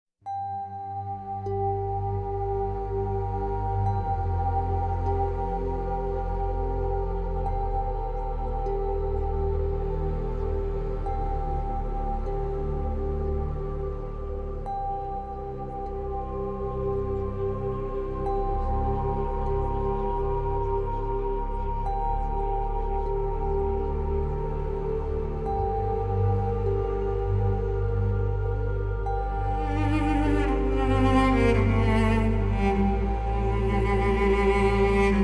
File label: Adagio For Strings and Organ